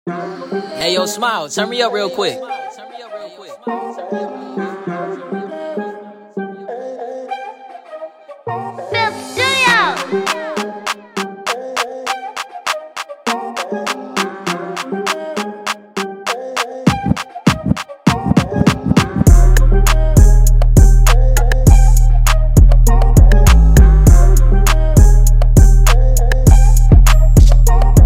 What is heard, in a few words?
splash